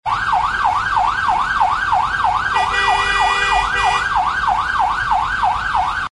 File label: alarms siren yelping ambulance in traffic